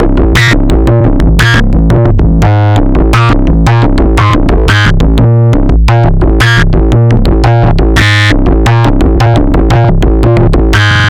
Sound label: Synth, Drum n Bass; KJ Sawka; Drum n Bass & Beyond